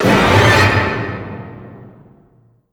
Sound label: String Medium